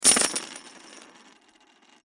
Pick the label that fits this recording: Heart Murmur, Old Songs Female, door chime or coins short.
coins short